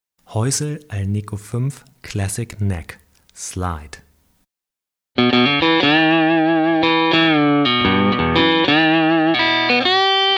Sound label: slide; Häussel Alnico 5 Classic PU for Strat